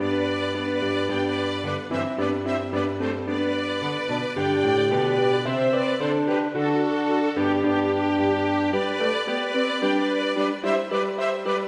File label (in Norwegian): Intro for to fioliner, horn og piano; Bang